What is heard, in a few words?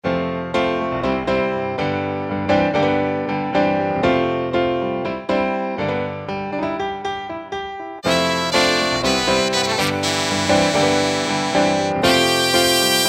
Big Band, ringtones; NGM WeMove Miracle